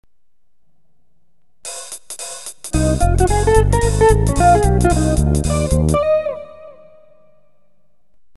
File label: jazz